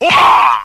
scream punch guy; ooh ahh

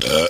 burp short

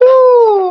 "ooh" male

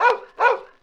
dog bark; Animals

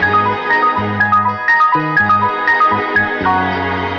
piano depression, Loops Sad

